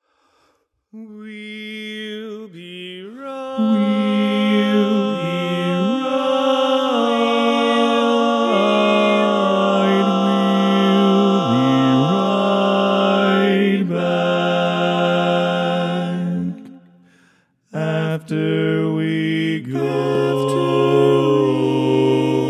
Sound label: We ll Be Right Back, Bass, Barbershop